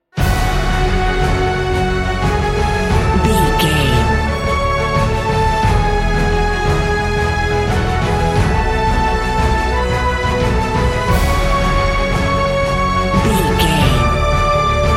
epic cinematic

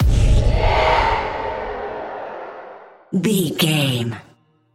magic death blast

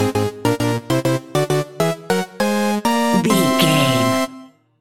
level video game stinger

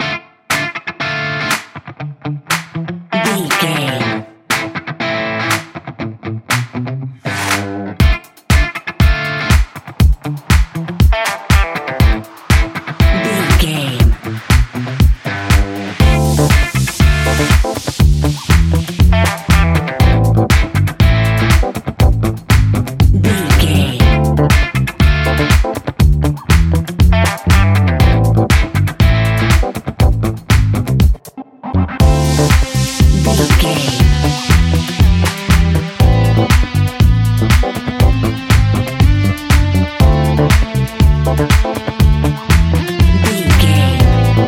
funky life

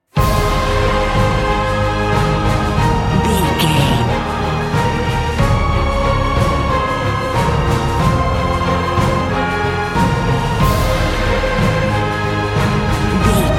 cinematic corporate

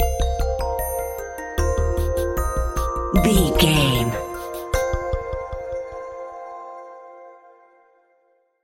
detective work stinger

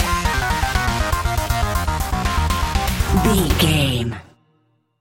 intense challenge video game stinger

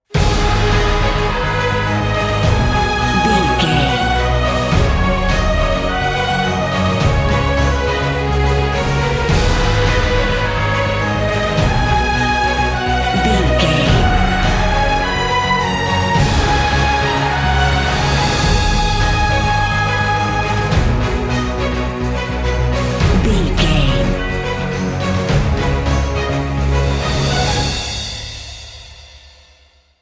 epic cinematic; all according plan

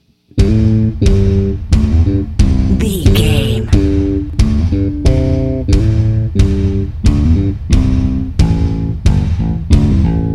monster trucking bass and drums

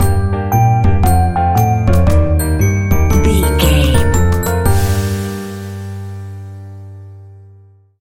invinsible ghost stinger

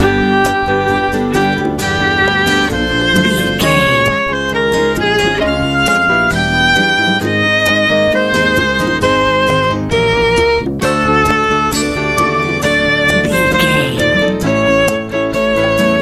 hot happy easy pop

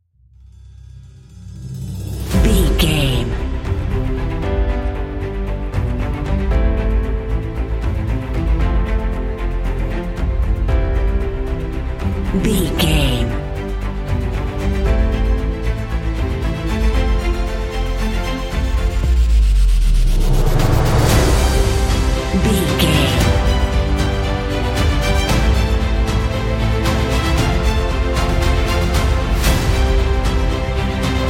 uplifting epic inspiration

